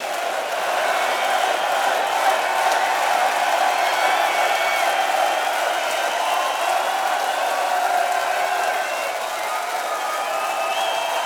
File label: Applause